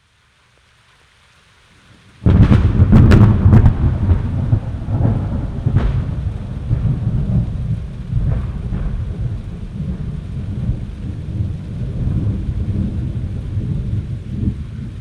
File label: ThunderClap; Jamestown NC